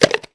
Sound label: pupa split